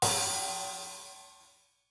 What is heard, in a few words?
half hat long